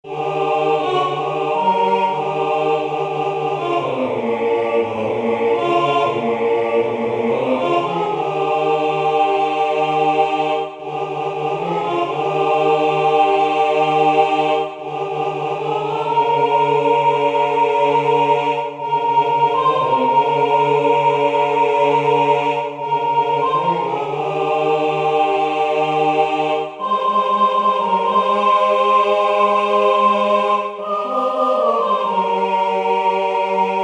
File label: Pottoka Mendian tutti